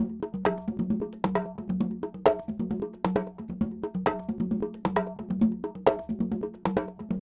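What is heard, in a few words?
conga, loop, percussion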